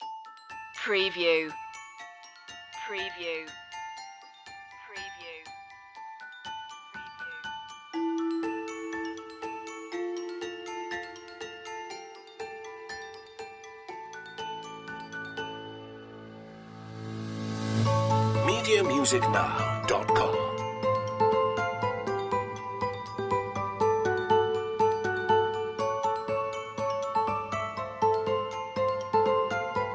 bell shoppe no piano chords

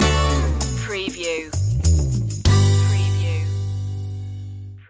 life under the sun stinger

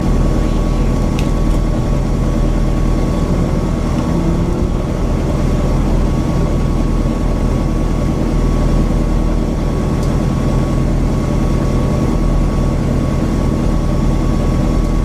Rally car tickover loop; beanstalk audio

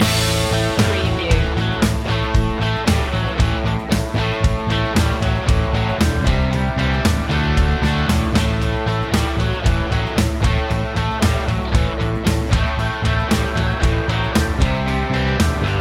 cruisin loop

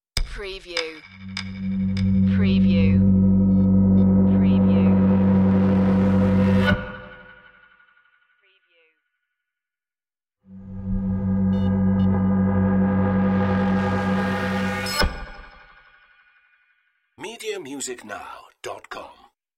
beaming

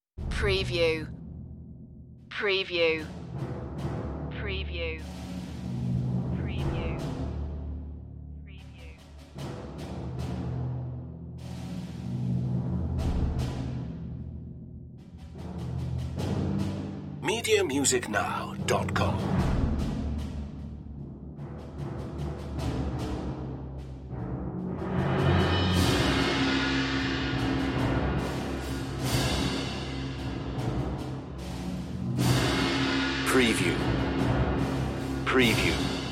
percussion only version

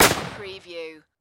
Rifle M1 Garand

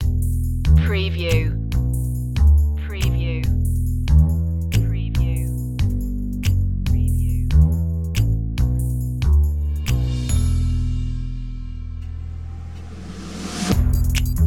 hot potato no pizzicato

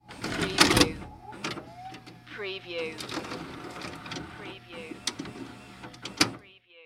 VCR Insert Tape

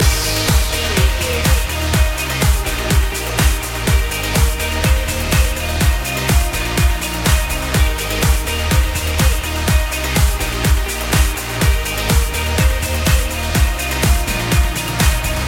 beach dance loop

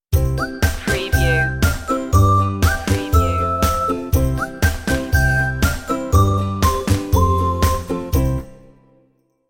whistle happy stinger

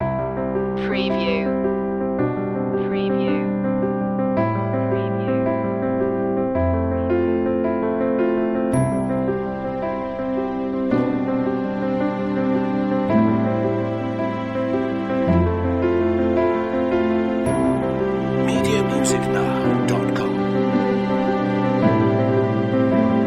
river of reflections loop

